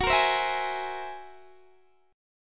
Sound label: F sharp 9th Flat 5th, Guitar, Open D